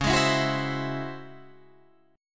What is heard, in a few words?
C sharp Minor Major 11th C sharp Guitar Drop D